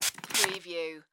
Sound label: Hairspray Bottle Pump